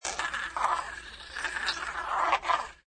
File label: CPU Voice; Alien cyborg language pharse with slight flange effect; Michael Huang